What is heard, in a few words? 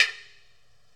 Film Reel Mallet